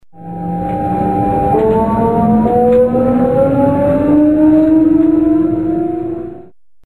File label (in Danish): s-tog